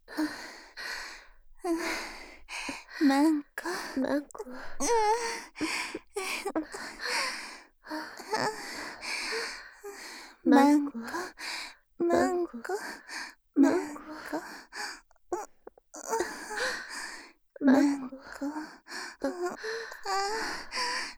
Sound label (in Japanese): 喘ぎ; おまけ4（文×名月）; 左右反転版; L名月R文